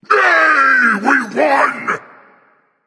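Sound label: non verbal yay, voice; cernunnos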